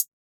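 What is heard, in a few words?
hi-hat Closed, Yamaha DJX 2B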